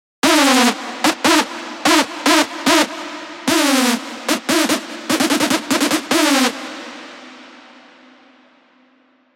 Screech; Screech Flex; alonso 18 year anniversary pack; Spire

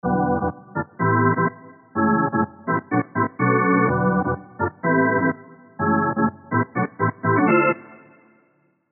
house stabs, serum 2; House Reserve; alonso saloon serum 2 house stabs

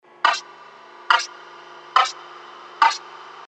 140 BPM, techno top loops; Subliminal; Revealed Techno Top Loops Vol. 8

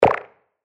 D#, percussion; Shinsei; revealed percussion vol 3

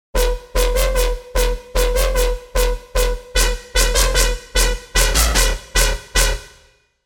Sizzle Di Place, Serum 2 hard bass; revealed serum 2 hard bass meltdown

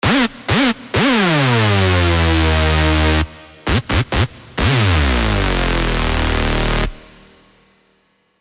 Hoover Modern, Serum2; alonso 18 year anniversary pack

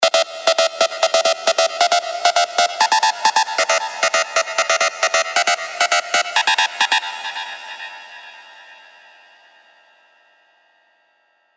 big room techno drops; Peyote Goat; Revealed Serum 2 Big Room Techno Drops Vol. 1

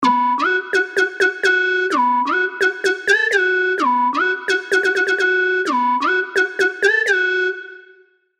LD Skittle Flute, sylenth1 dirty dutch leads; revealed sylenth1 dirty dutch leads vol 1

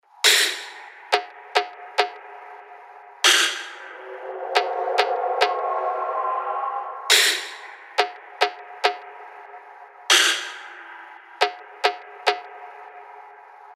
PercFX Loop F# 140 BPM; Immortal; revealed fx loops vol 2

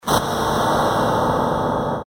percussion; Dearly; revealed percussion vol 2

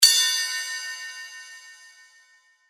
Ride, cymbals; revealed cymbals vol 1

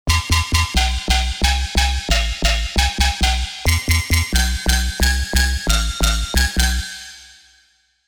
Cowbell Again; revealed serum 2 hard bass meltdown

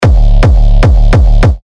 Kick D; Toph; Revealed Terra Kicks

